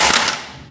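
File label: physics clipboard impact medium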